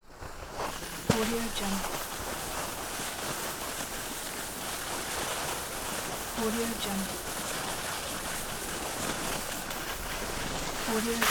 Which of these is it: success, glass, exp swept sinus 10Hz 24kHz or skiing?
skiing